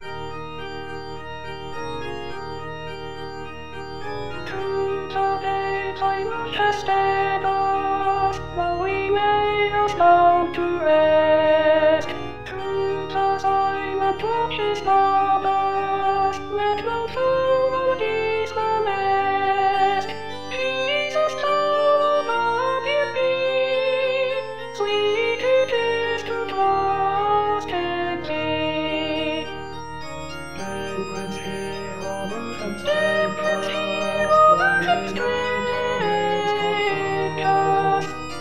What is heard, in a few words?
Through the day Alt